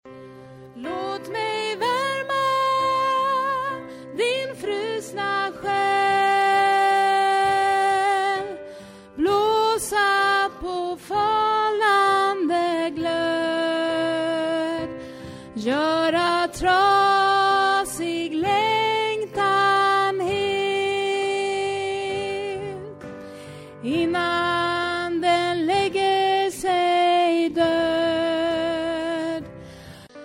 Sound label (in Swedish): bas, stammor